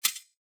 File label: Hihat, Dubstep; Ghosthack